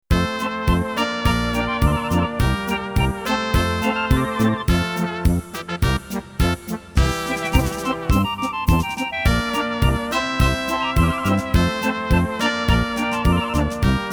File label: KORG PA80 polka